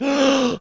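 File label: ZOMBIE HURT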